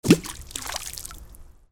WATER

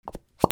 BATHROOM

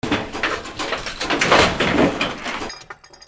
FALLING

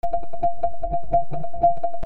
RHYTHMIC

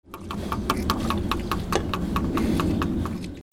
BIRDS

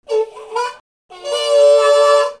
MUSICAL

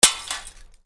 BREAKING

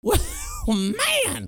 PEOPLE

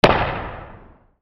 WEAPONS

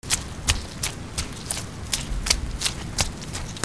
FOOTFALL

